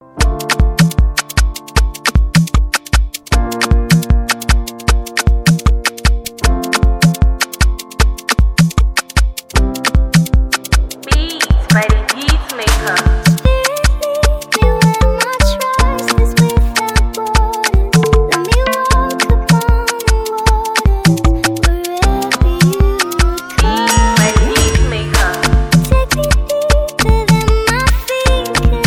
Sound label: Beat